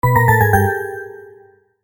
Rating Down Sound; Degullmok

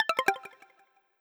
Close Or Disable, Modern UI SFX; Slides And Transitions

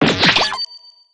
mine; Cartoon Game Sound 2.0

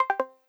Close Or Disable, Modern UI SFX; Slides And Transitions

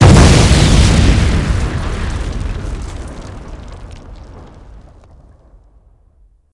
tomahawk; Cartoon Game Sound 2.0; JBoB Sound Pack Demo; Bonus

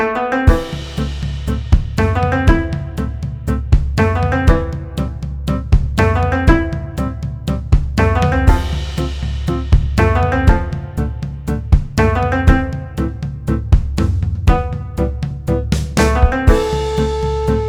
Game bgm; Degullmok

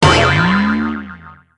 umbrella; Cartoon Game Sound 2.0; 카툰 게임 사운드

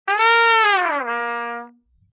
Draw Sound; Degullmok

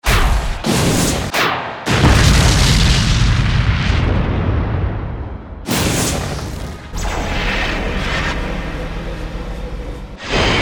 Magic, Cartoon Game Sound; Cartoon Game Sound 2.0; JBoB Sound Pack Demo

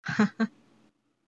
haha; DDSystem

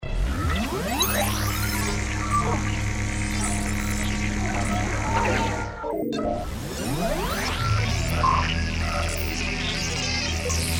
Sci-Fi SpaceShip Signal; Cartoon Game Sound 2.0; JBoB Sound Pack Demo

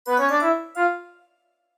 Rating Up Sound; Degullmok

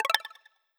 Open Or Enable, Modern UI SFX; Slides And Transitions

